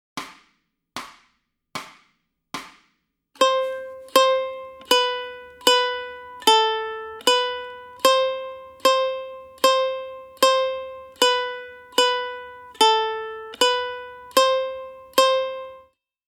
Ton C Warm-up, ukulele

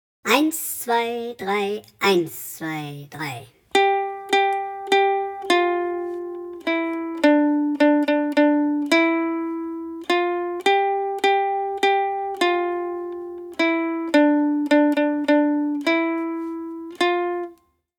3 4 Warm-up, ukulele; Warm-Up D10 ¾-Takt

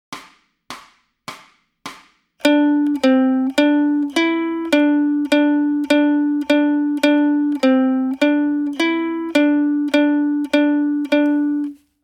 Ton D Warm up, ukulele

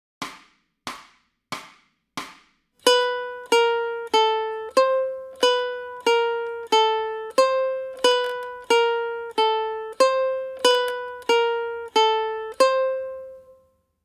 B Warm-up, ukulele; Ton B

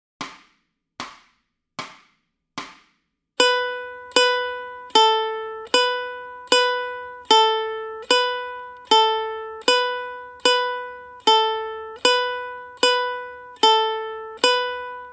Ton H Warm-up, ukulele

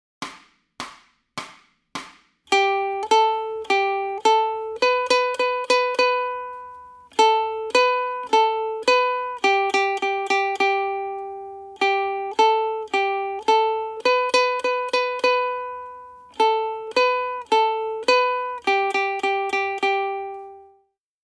G-Saite Warm-up, ukulele

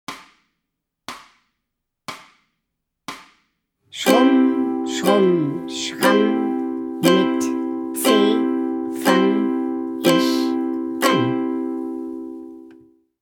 Mein erster Akkord, ukulele

